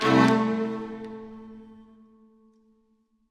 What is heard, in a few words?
music puzzle note up